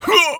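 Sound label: voice outcry large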